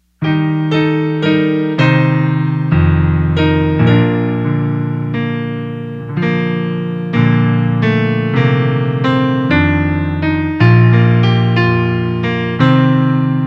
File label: instrumental peace song